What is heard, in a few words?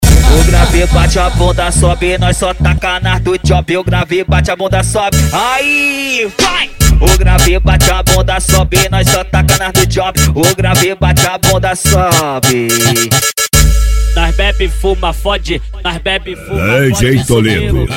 funk